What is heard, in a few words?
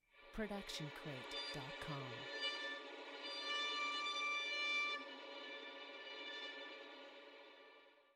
Violin Airy Scratch Harmonics